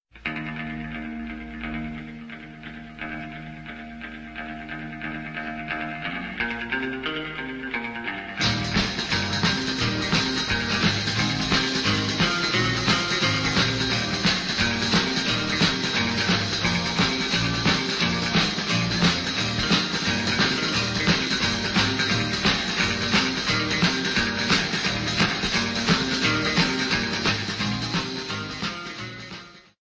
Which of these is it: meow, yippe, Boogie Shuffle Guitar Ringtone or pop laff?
Boogie Shuffle Guitar Ringtone